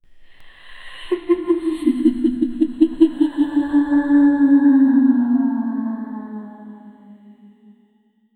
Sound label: terrifying female ghost